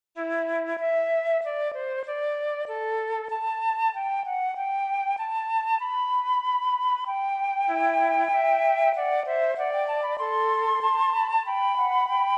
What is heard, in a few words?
Duet for Two Flutes; Juan Garcia Music